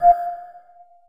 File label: whitehole unlock, LG Optimus G ui; LG Optimus G F180K